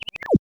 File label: Fish; Gonner